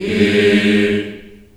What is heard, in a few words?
G#2, Choir